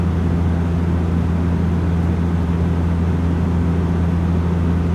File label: propeller